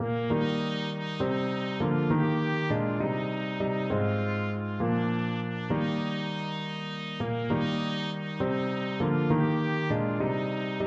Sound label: trumpet